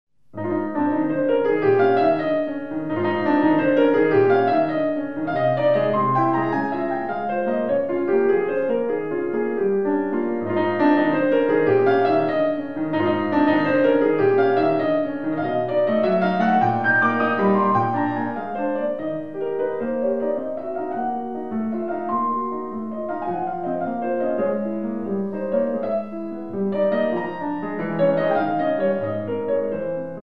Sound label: Chopin Piano Recital Impromptu op.29 live; Pianist